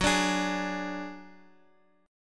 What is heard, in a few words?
Gb Suspended 2nd Sharp 5th Guitar Open G